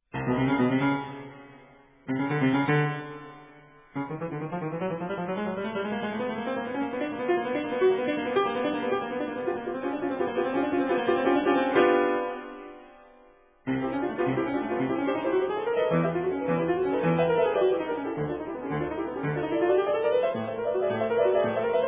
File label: piano works, concert study in C major; SMETANA; KOMPLETNI KLAVIRNI DILO B SMETANY